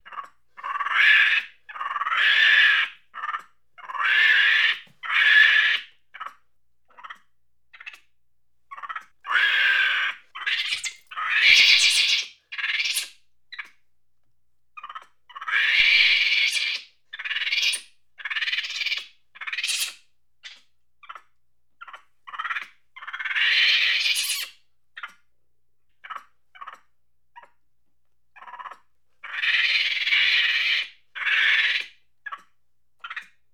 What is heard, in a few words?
Monkey Angry Chatter